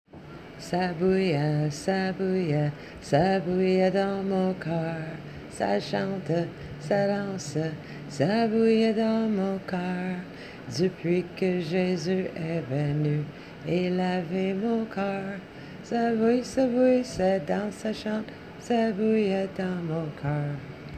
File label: Bubbling